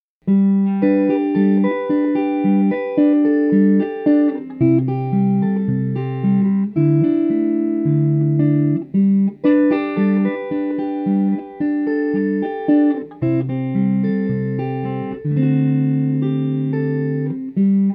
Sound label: Solo Guitar